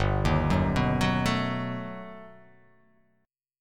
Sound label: G Augmented Major 9th